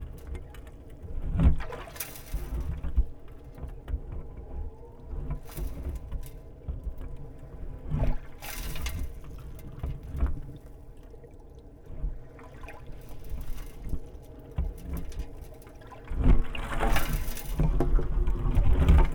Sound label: Binaural Recording